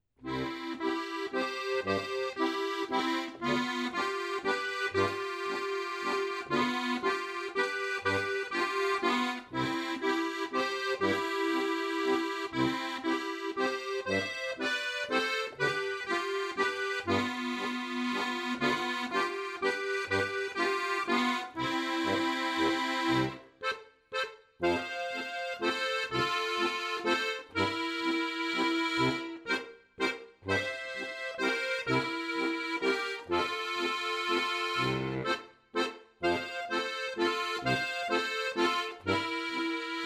Walzer

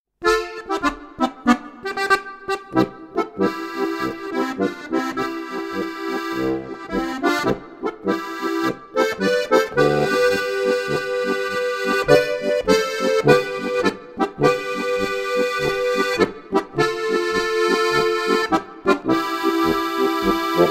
Polka

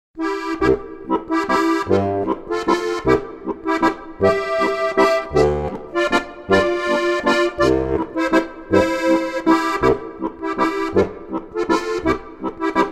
harmonika

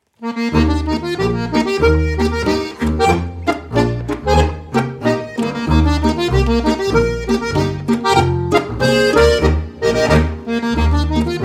Boarischer

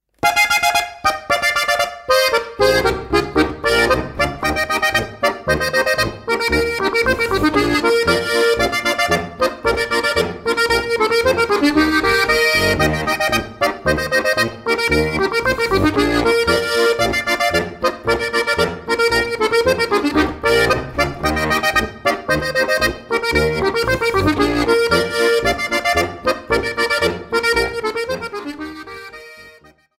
Boarischer

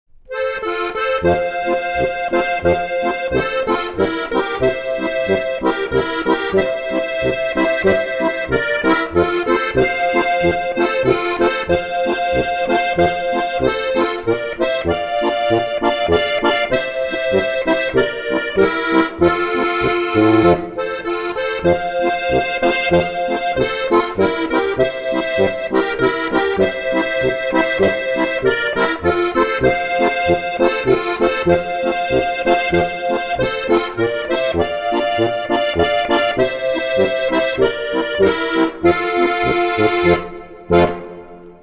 harmonika

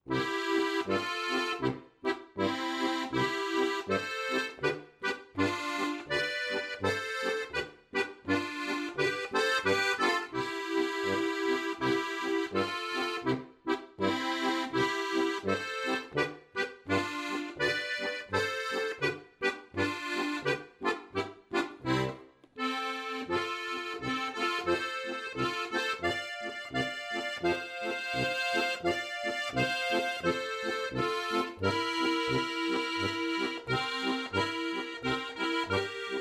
Polka

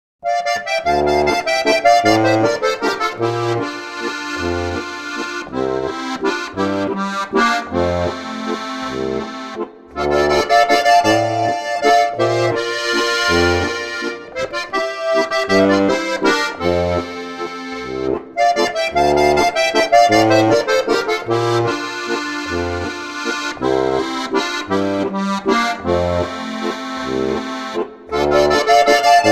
Walzer

